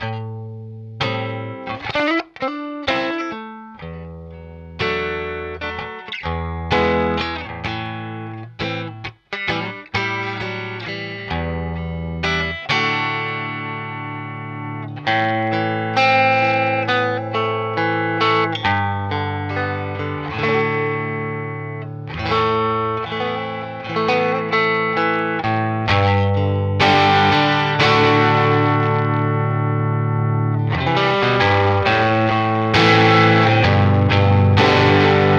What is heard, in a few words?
philips 6l6; bandmaster; nos